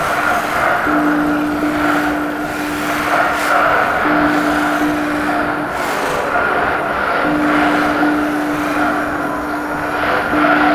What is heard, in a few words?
Combat Loop